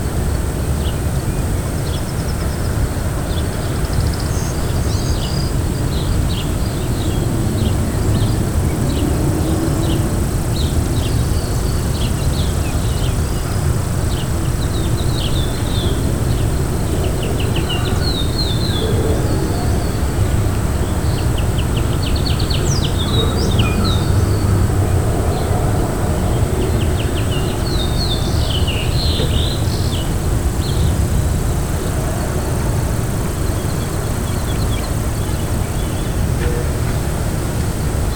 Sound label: Loop Nature Ambience Hot Dry Summer Day With Bugs Atmosphere; Sunflower Land